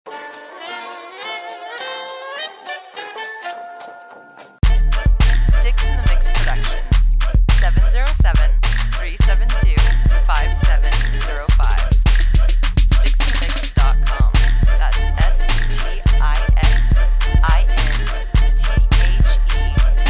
music

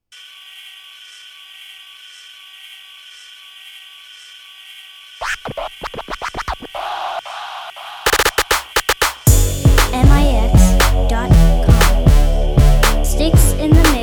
scratch

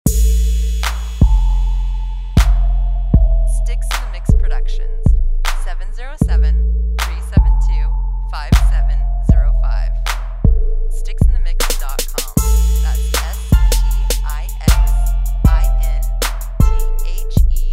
Trap Beat

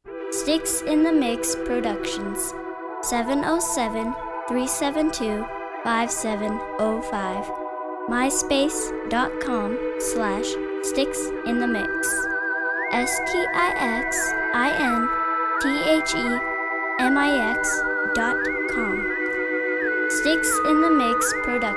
beat 85bpm

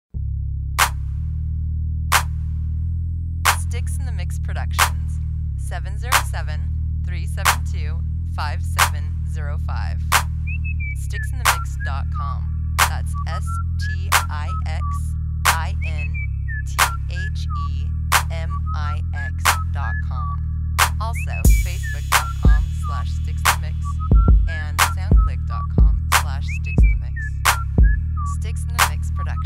Dark Whistle

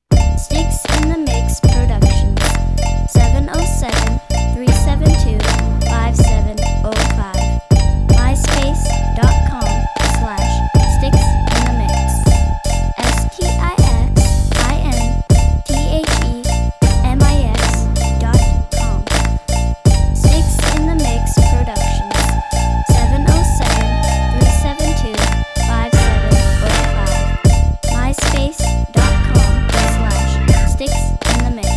Toy Piano, beats

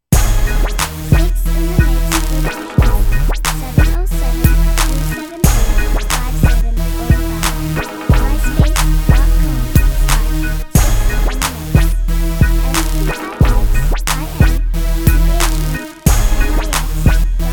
beat

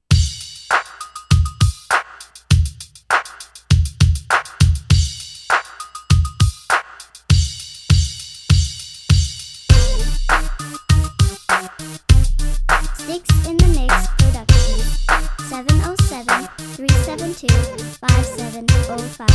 crunk

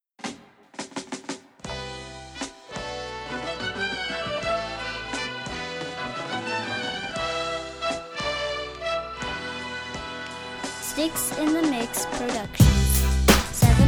solid brass beat

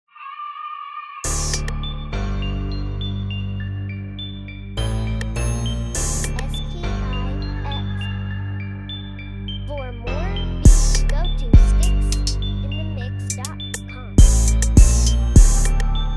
Phantom Piano

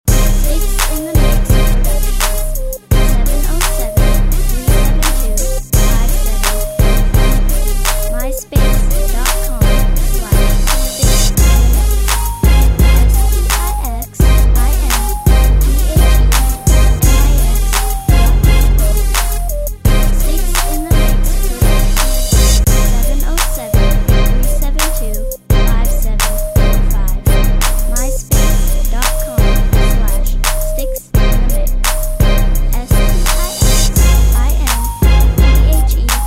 krunk

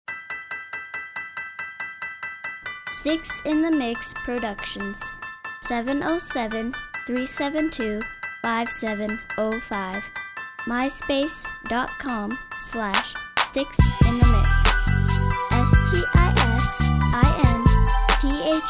bassline

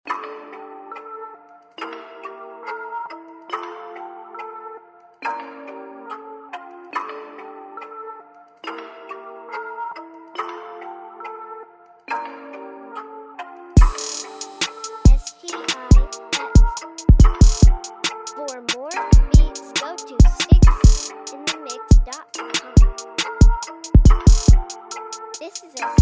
Death Trap

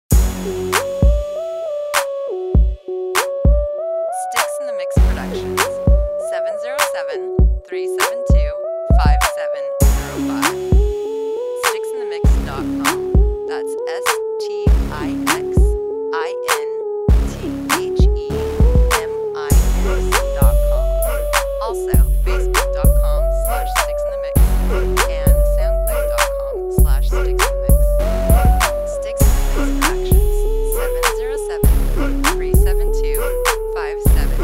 Uptempo worm

